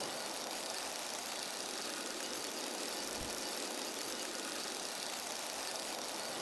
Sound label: Box Crystal Loop; TANK; 3Souls